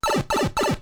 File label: Bubble Disappear; Salem's Sound Schemes; mario